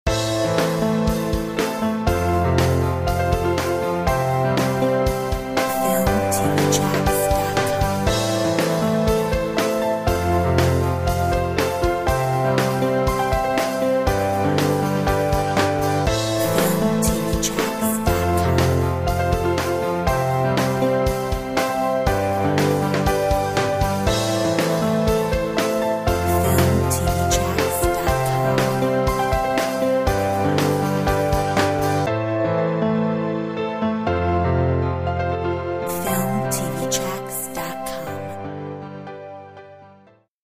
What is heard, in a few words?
Blue Hill